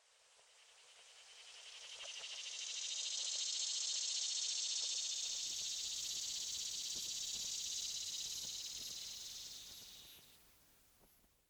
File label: Afternoon Cicada